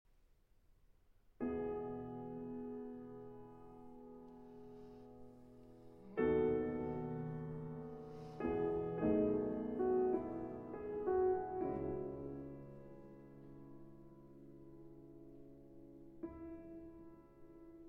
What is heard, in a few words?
Piano Concerto in C Minor, Largo